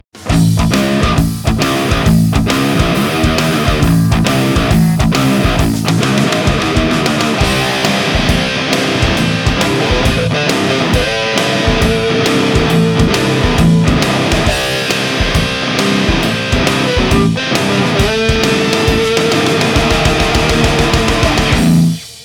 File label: post grunge